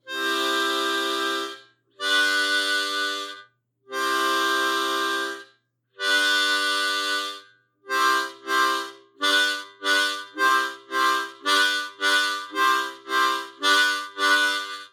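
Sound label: C G Chords Basic, harmonica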